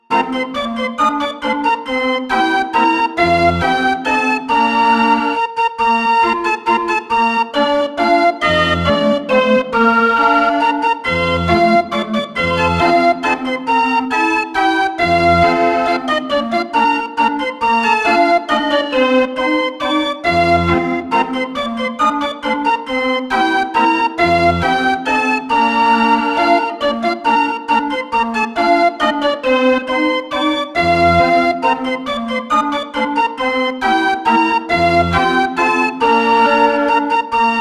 Keyboard Instrument; assassin s creed syndicate

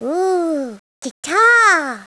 Sound guitar

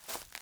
foliage step

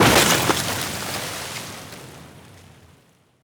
ice break; teardown; ps5 windows xbox series xs

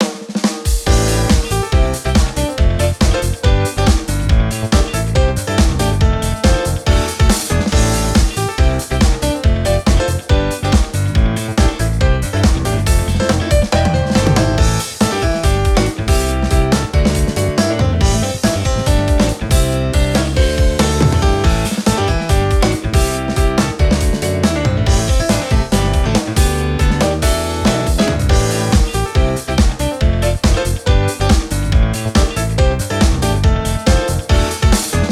Music Menu event

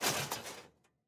metal break

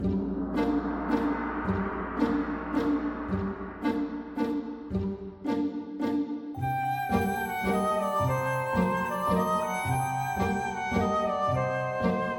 Pad; Maharaha Rasta; tintin reporter cigars of the pharaoh

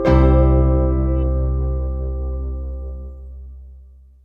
transform stinger

